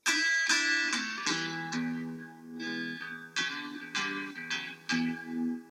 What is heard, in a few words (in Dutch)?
Muziekfragment Rock